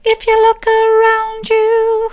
soft, vocal

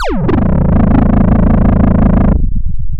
pop, chainsaw

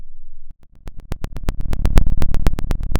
Sound of a fire crackling

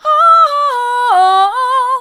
ooh mid, vocal

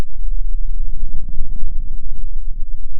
pop, Sound of a thunderstorm

